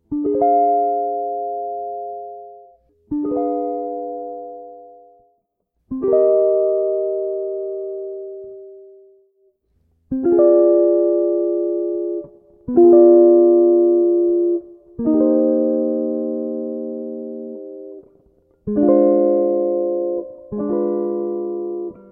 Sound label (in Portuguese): Conducao de Vozes; Jazz Harmonia